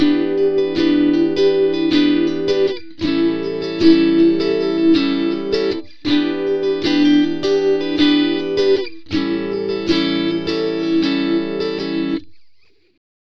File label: Rytm Gtr E, Slo Mo Funk, Lounge; Zero-G Kocktail Kollection; Construction Kitz (Lounge)